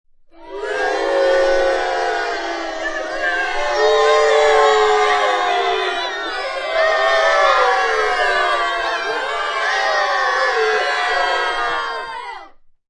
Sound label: Children Booing; Crowd, boo; Voice